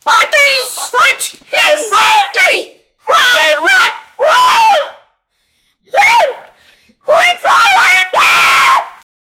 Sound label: yelling; voice acting